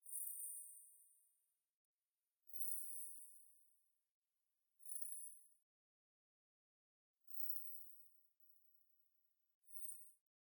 Ultimate Twinkles

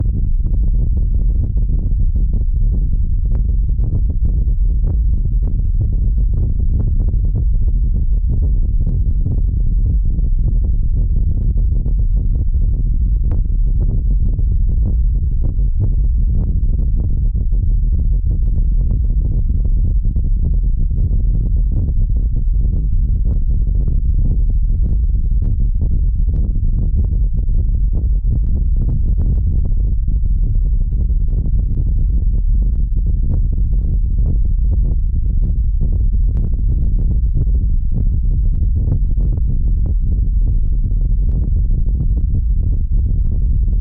Earthquake

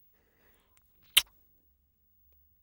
Kiss

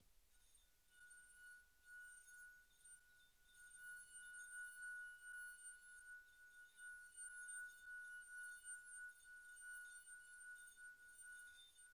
Singing Glass

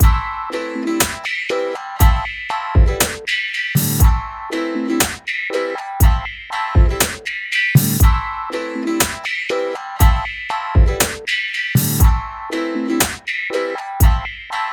Acoustic Guitar Drums Loop 120bpm 4 4

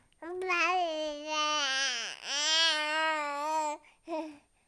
Baby Voice

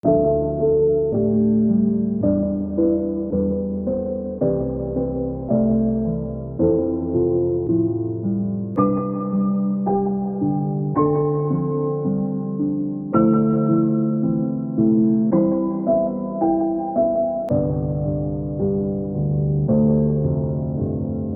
Piano Chopped

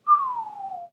Sad whisle

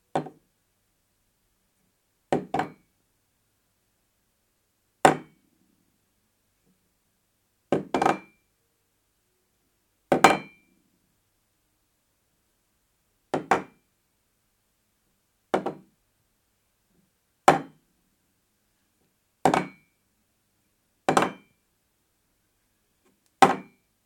Mug On Table

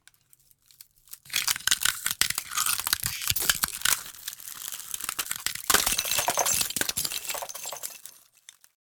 Twisting Ice Tray

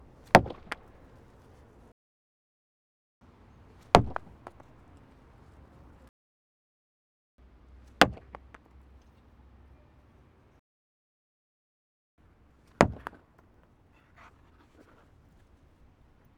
Hockey Outdoor Puck Hit Board Clean

